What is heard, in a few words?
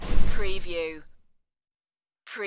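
Garage Door Slam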